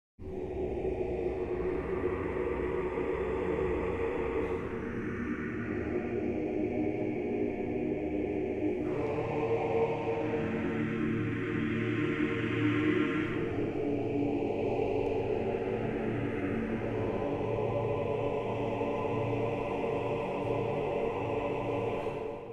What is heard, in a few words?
Morph Mode; Wotan Amazona Demo 3